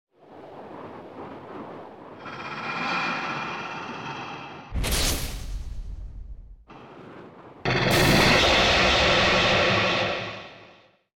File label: Appear